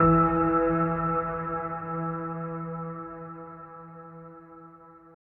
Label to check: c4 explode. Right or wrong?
Wrong